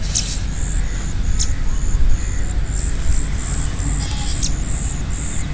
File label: bird audio